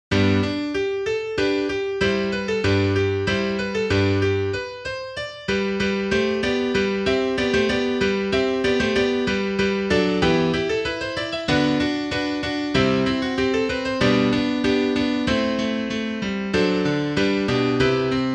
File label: Et exultavit Piano; capella; magnificat